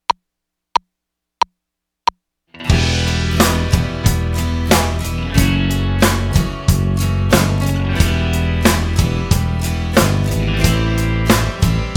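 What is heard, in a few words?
Minor Melody Without Guitar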